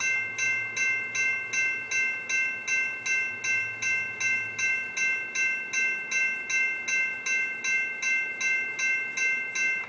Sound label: bell